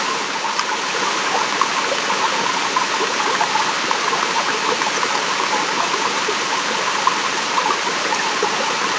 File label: ponds night, ambience